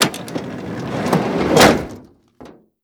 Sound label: vanslide close; favela; Call of Duty Modern Warfare